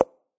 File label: pop; CrossyRoad